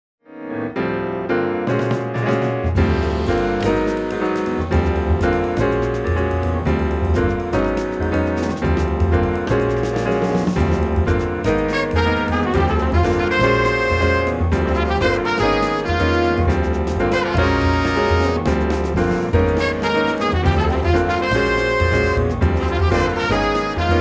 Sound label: sextet